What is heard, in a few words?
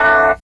Bell; Sonic Foundry (Sony Creative Software); Esoterik Beatz; Saver FX